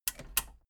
metal button radio click power off slow

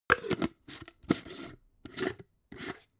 jar glass plastic close slow

